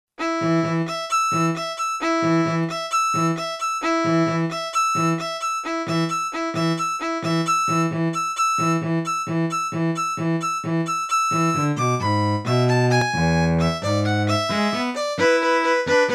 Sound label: Trio